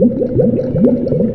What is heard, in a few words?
water, fx & sweeps; EZRollers